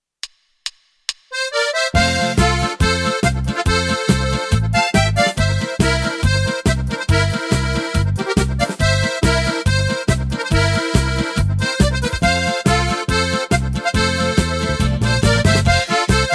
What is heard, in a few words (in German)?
Polka mit Melodie